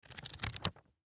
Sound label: Magazine Flip, Magazine Flip Through Pages; Paper; Foley; Materials; Blastwave